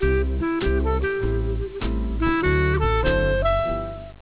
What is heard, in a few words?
jazz